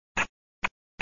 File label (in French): texture bisous